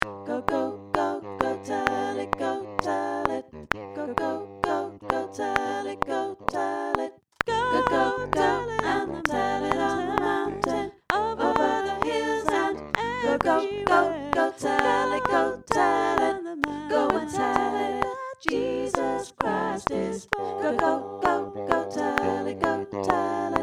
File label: Go Tell It all parts, Gospel Choir